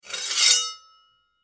Sword Scrape Large Heavy Blade Medieval; Combat; Warfare; Blastwave

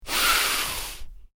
Male Sniff Long; Male Vocal sounds; Sick; Human

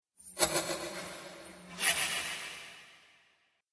Magic Illusion; Magic Sound Effects; Magic - Illusion 04; Role Playing Game

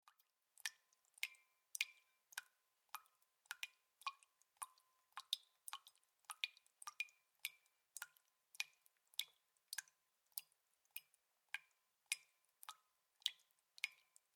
Water Drips Into Glass Slow; Kitchen; Household

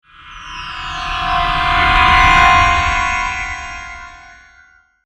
Transporter, Rematerialize, Science Fiction

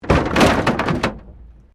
Wreck Door Panel Clunk Metal

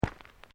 Footsteps Sneakers Road Step

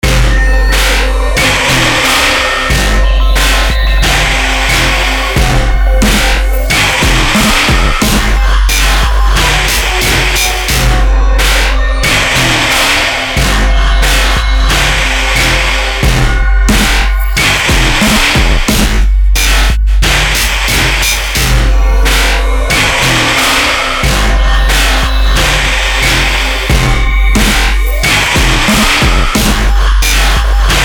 BEAT SLOW EERI, Beat Slow Eerie Industrial; Miscellaneous Loops

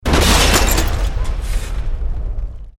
Explosion Medium Metal Glass

